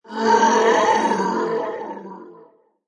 Creature Scream Delay, Monster creature or fantasy animal, howling; growling a war cry. Twisted, reverberant sound.; Monster Sound Effects; LynneMusic